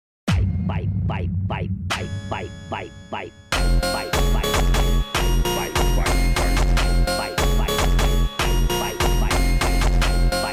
Automotivo sped Up, funk

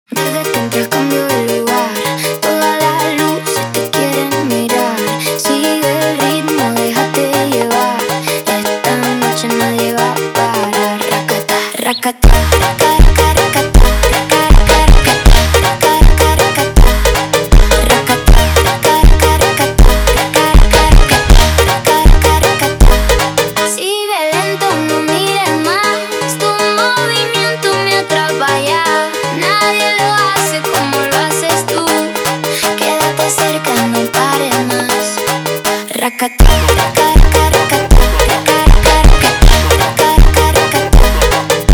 sped Up, funk

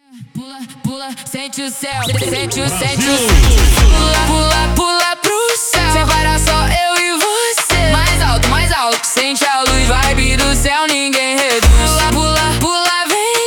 Jumpstyle slowed

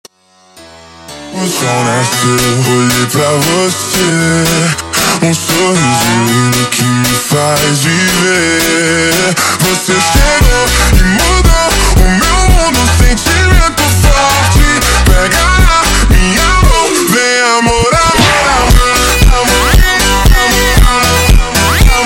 Funk slowed

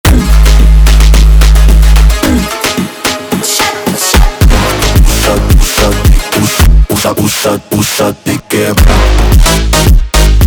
phonk music

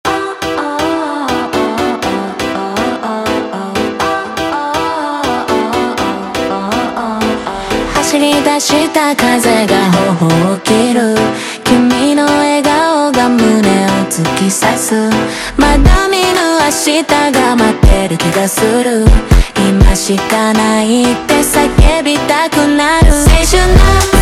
Funk slowed

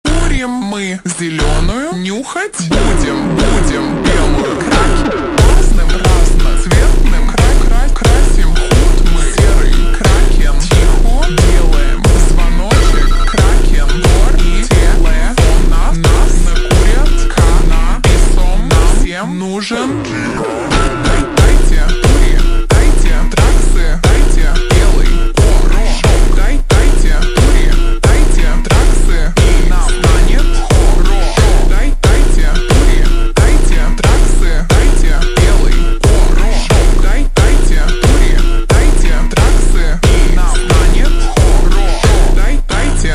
Hardstyle ultra Slowed

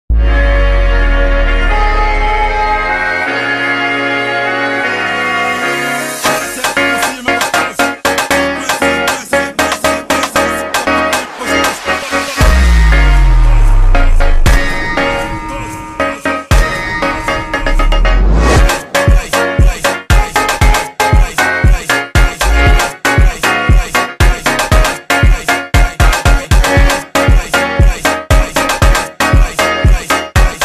Funk Woodwind slowed

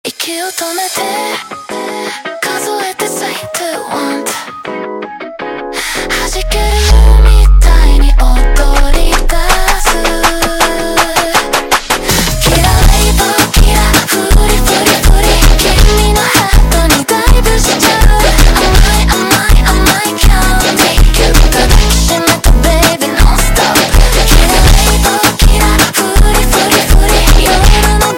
Brega slowed, funk

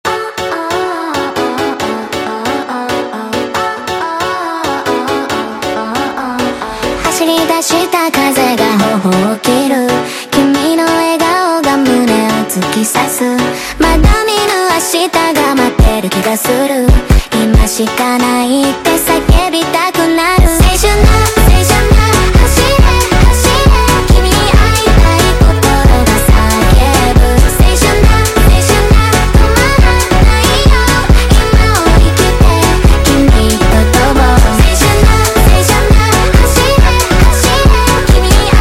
Funk sped Up

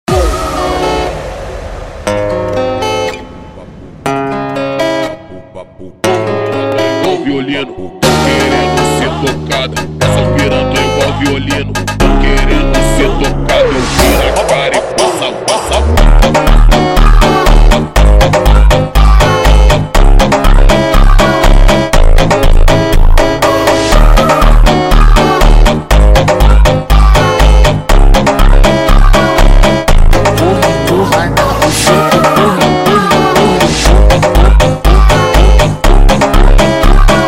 Scared Girl Funk slowed

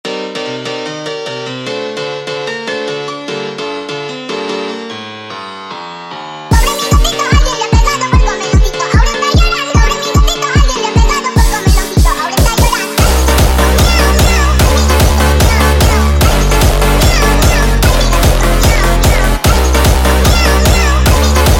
sped Up; funk

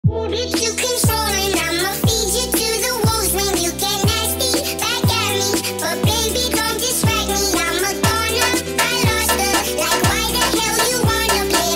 FUNK (Instrumental Version)

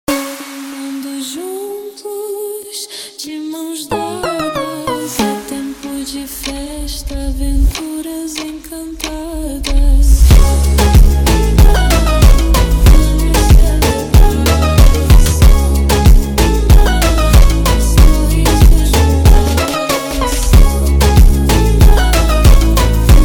slowed, funk

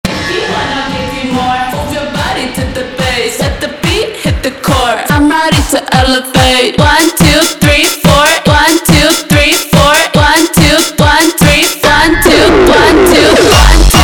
slowed, funk

